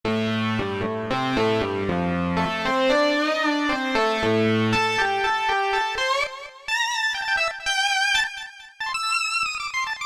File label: Synth Lead, Roland PN-D50; Roland PN-D50-01